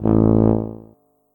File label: tuba note